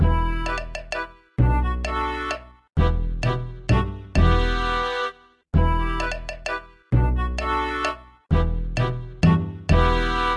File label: Sea Shanty